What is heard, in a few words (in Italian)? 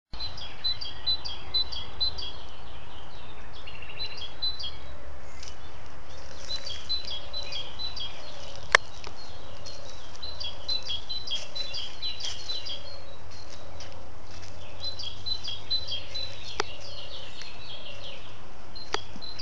cinciallegra